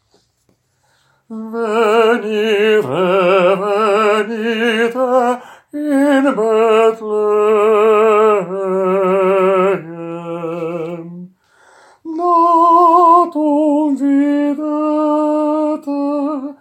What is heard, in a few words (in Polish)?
SOPRAN; Adeste fideles